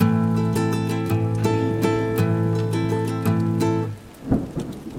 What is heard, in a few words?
Guitar 6-8 Slowrock 110BPM Am, nylon guitar; beatcraftpro